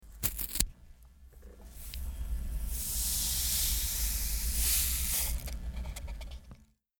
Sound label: stick sugar